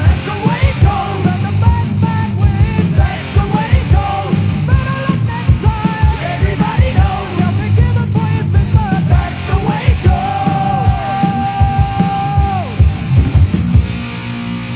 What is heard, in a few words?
chorus